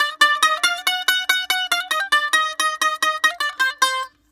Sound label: BAGL D, BAGLAM, Mediteranean Traditions